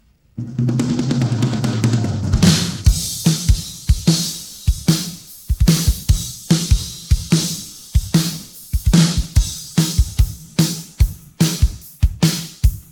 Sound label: pattern, drums